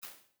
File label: Room Amb; impulse; UVR resources